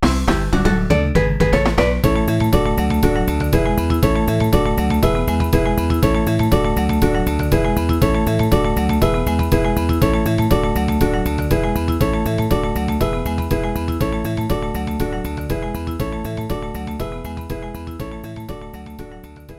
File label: Win; Delfino Plaza Court; Mario Power Tennis